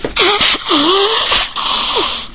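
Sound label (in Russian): высмаркивание женское сильное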